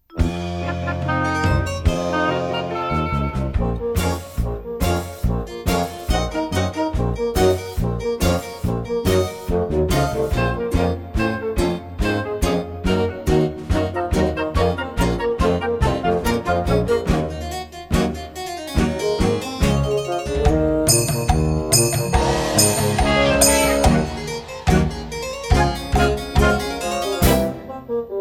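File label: instrumental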